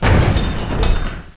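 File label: swing stop; doors; Hexen II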